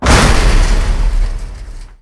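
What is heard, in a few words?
debris metal medium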